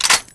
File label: svd bolt forward